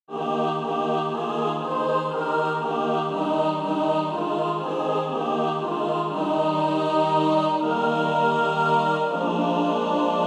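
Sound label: mixed, SATB Practise Music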